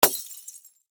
Impact Flood Light Bulb Smash Glass; Lights; Household

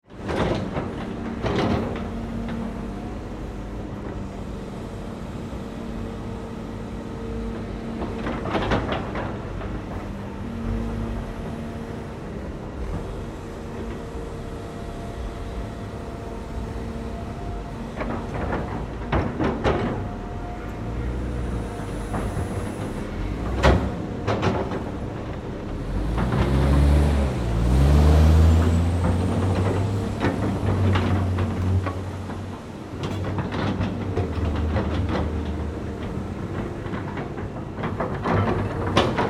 Ambience Construction Site Heavy Trucks Industry; Construction Sound Effects; SFX Bible 6

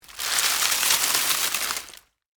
Foliage Crunch, Movement, Palm, Frond

